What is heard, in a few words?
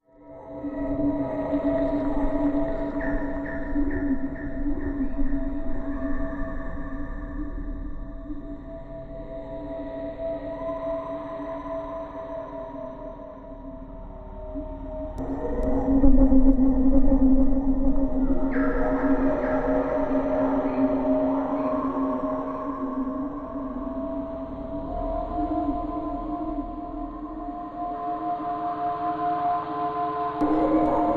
Darkened Wood Eerie, eerie ambience